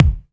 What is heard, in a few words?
HOUSE DRUM BD; AKAI S6000 CD-ROM - Volume 1; DRUM RYTHM